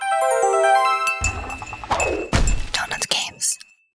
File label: Loading; Castle Smasher